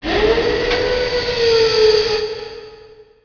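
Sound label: ambience crane winch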